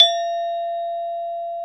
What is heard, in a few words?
Saran F3; Planet Earth; Digital Sound Factory Planet Earth